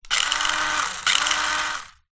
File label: leisure radio controlled car rev free wheel wheels in air; zapsplat